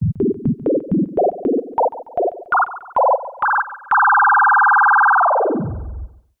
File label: synth rocket clock robot wobble power up and down